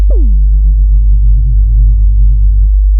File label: Sound of a mud pump